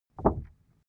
cardboard wobble short hard

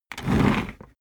office chair worn trundle fast

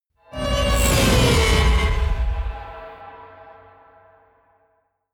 whoosh scifi flyby

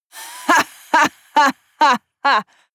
female laugh mocking

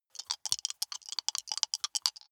big two rattle in hand closed

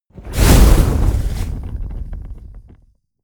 whoosh fire ignite medium

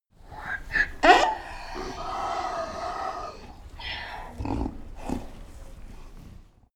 donkey bray faint

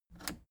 plastic button scratch smooth push enable

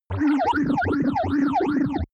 fast pulsing wobble synth

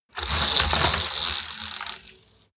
roll-down curtain roll down

